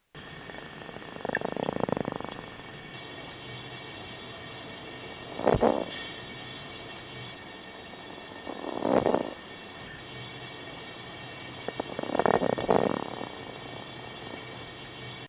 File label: Trigloporus lastoviza Growl